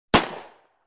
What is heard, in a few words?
Winchester 12 single shot